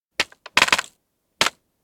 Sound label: popcorn pop; anemoi